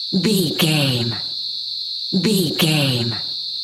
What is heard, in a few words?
cicadas night loop